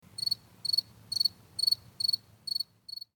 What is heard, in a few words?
crickets